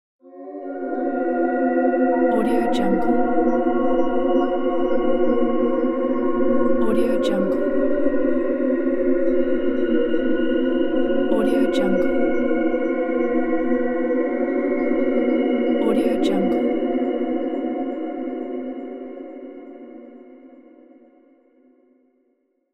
ghost cartoon effect

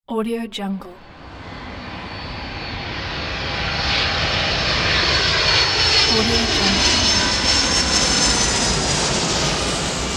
aircraft pass by

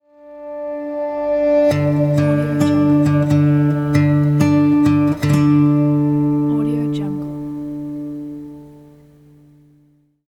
acoustic guitar intro sound

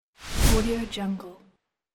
slideshow

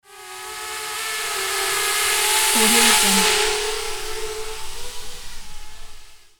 little drone flyby; yevhen lokhmatov

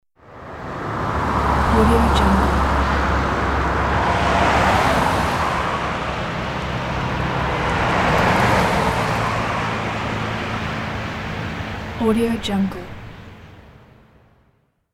several cars in the urban traffic

